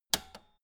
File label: old button press jump out